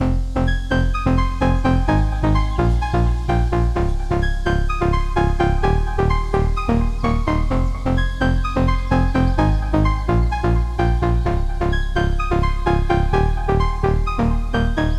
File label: 128 BPM F Min, House, Breakdown Loops; Sunrise; Cymatics